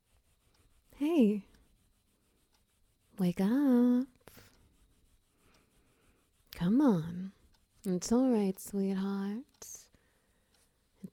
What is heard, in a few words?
Ambience; Nightmare Protection Squad